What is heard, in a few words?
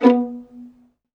violin C#; ILIO; Synclavier Strings